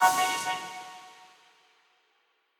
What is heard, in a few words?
Quasar Clear B